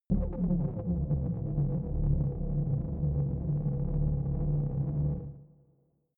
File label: space dark engine room craft